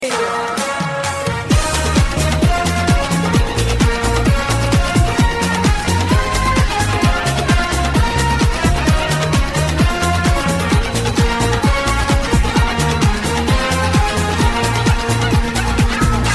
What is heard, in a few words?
Samba